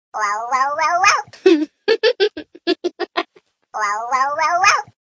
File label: baby ring ring